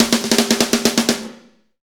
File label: Fast Shuff, Drumscapes Roland; Northstar